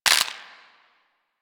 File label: Inf Sniper Rifle Mech, Infested; Warframe